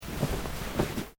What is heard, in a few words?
Clothing Kimono Sleeve Move; Movemement sounds for various articles of clothing; Dressing; Human